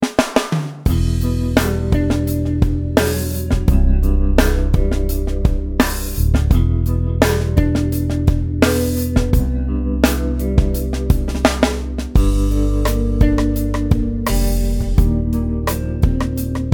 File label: Backbeat 85 Lefty